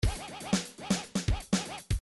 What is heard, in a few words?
house 1bar